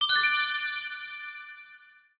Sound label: star earned; guodongrenwu